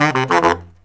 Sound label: Killer Brass Riffs